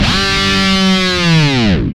GTR, E.Guitar FX; Roland LCDP02 Guitar and Bass

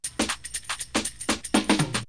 Drumloop; ZERO G - Datafile DISC 1

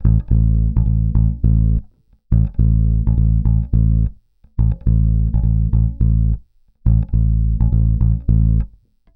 Rock, Bass; Bass X

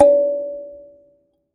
Finger, Prepared Kit; Battery 3 Sample Kits

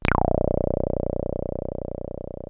303 A1, TB 303

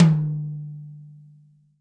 TOM, Real Drum Kit, FUNK KIT

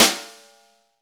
snare, Roland; Club-50 - Foundations Roland; Ext.Snare 4

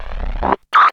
BLURP LOW, Hard & Loud Techno

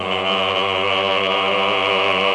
CHOR; ILIO; Propeller Island Legendary M400

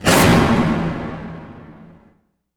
cinematic impact wipe

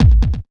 KICK hardcore, Techno Trance DRUMS

